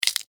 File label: Insect Claw Snap, Movement, Bug; Animal